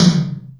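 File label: Md Phase, Alesis D4 drum machine tom; 300 Drum Machines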